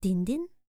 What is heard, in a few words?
TABLA BOLS, E.INDIAN; ILIO; Vocal Planet